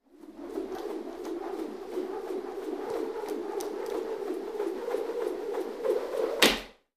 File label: Rope Swings, jump rope; No FS